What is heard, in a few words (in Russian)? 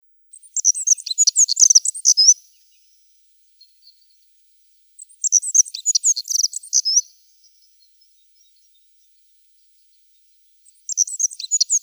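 Zavirushka chernogorlaja, black-throated accentor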